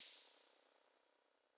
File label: CYMBAL, Sound-Master SM-8